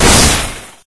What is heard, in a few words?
beam zap; Source Engine; bshift